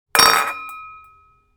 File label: Wine glass falling without breaking